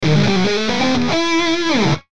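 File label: Musical Element, Drum Snare March; Blastwave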